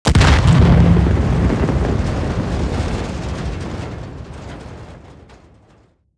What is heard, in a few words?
metal cistern; World of Tanks Blitz